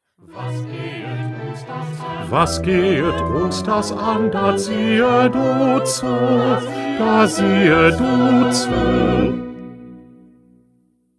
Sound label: Bass; Bach; St Matthew Passion